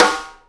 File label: Stick, Drum Machines